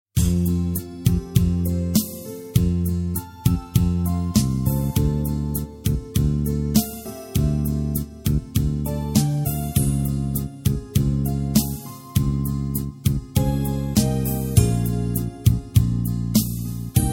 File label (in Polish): chleb pielgrzymow instr